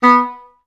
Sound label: EHS STAC B2, Advanced Orchestra; Best Service; Peter Siedlaczek's Advanced Orchestra